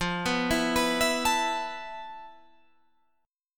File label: F Major 7th Flat 5th, F, down, Guitar, Drop D